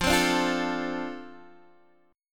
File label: F 6th F down Guitar DADGAD; F6 strummed